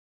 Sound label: Jingle; SNES; Dragon Slayer - The Legend of Heroes